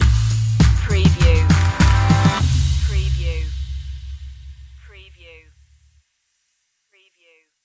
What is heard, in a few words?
see you smile stinger